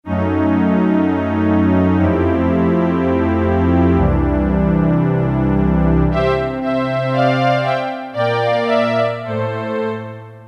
Str Sect, Roland MT-32

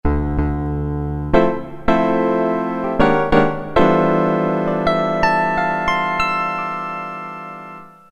Acou Piano, Roland MT-32

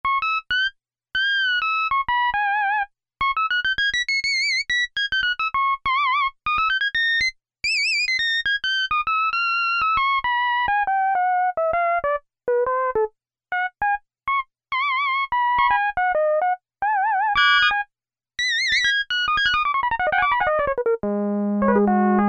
SYN-LEAD 1, Yamaha DX7

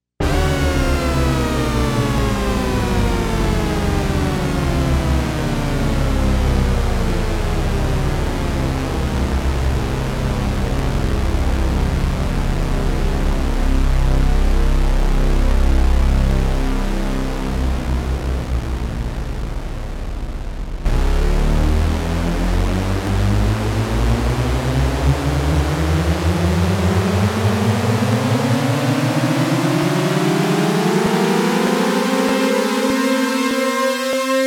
Unison Port

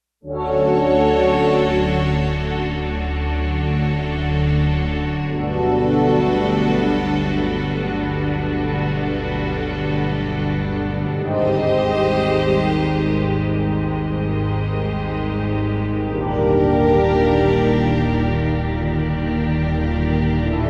Fender Pad; Digital Synthesizer

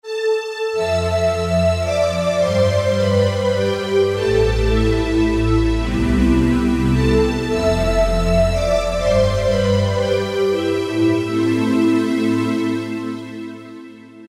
String Vox; Factory Preset examples

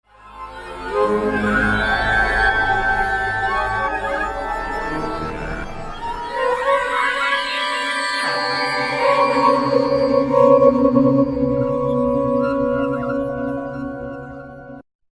Wailing Pad, Roland V-Synth; Factory Patches

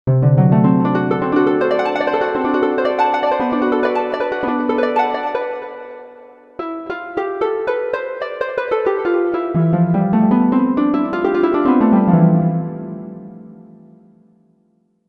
Soft Harp, Korg MSC-1S; Korg MSC-1S Variety

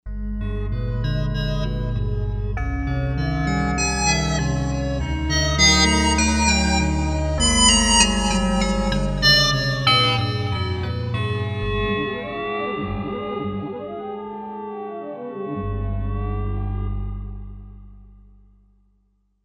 Bell Low; Novation UltraNova; Factory Patches

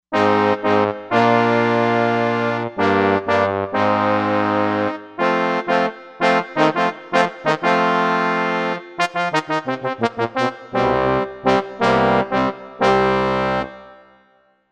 BIG BRASS

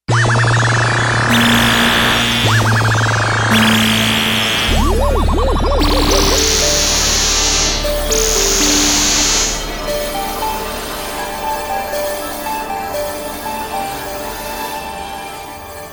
FX Menu

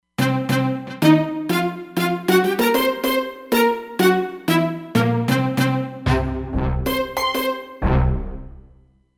Tutti, Roland Fantom XR

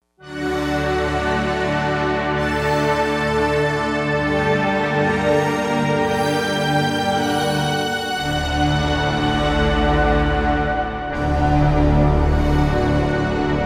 Soft Chime; Digital Synthesizer

